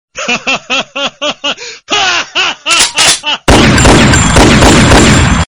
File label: haha laughing